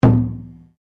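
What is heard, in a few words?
Drum Taiko forte, Japanese Taiko Drum; Miscellaneous Musical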